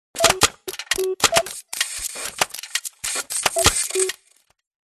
science fiction steam punk machine operate clicks beeps; Audio Mangler